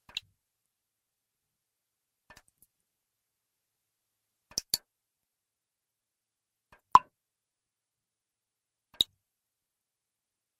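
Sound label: dripping fountain; Brysh Poy Stazei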